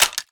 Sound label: clip in; hl2rp; weapons